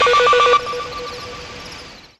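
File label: Rave Synth, Dance Mega Jungle Rave; Best Service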